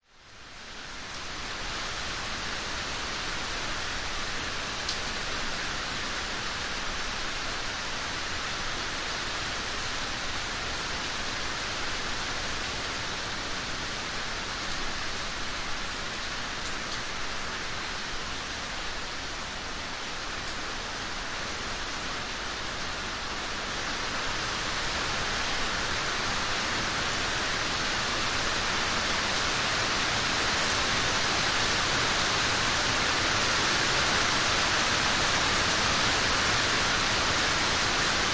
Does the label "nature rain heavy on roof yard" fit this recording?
Yes